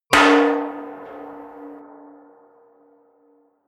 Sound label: large can reverb, hits impacts; whooshes rises